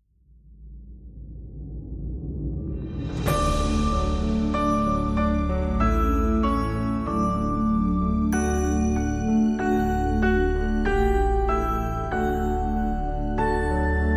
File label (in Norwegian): Deilig Er Jorden (Norwegian Christmas Song Based On Schesien Melody), Verse 1